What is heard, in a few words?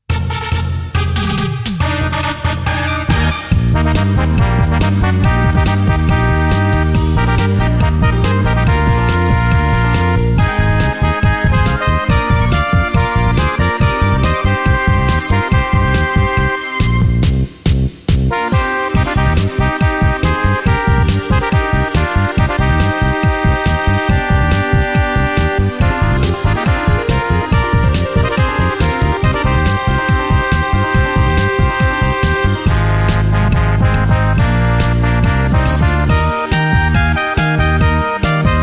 fanfare